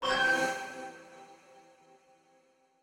Quest Kiosk Found; Quests

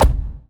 ball collision geo low

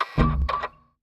UI Currency Error

